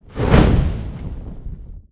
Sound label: Fire Lord Attack